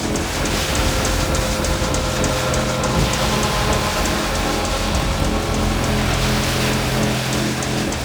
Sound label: Reactor Loop, Ship; Ambience; Props; Warframe; Corpus Ship Remaster